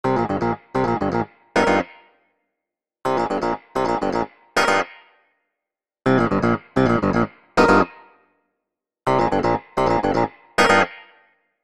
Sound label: clavinet pickups